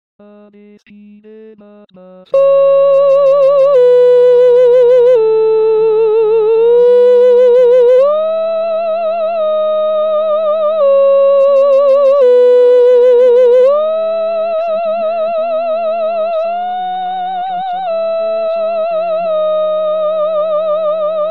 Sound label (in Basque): xalbadorren soprano